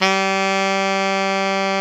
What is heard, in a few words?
SAX XALTOS, Saxophones; All Saxes